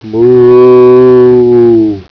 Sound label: moo